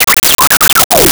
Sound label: Little Droid, Science Fiction